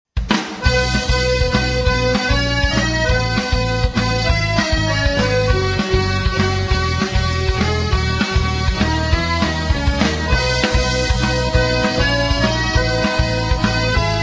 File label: accordions; puppets